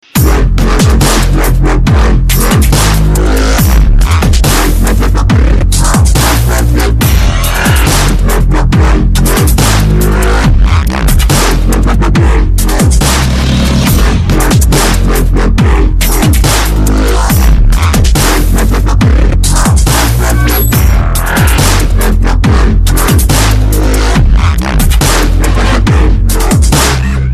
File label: subsonic dubstep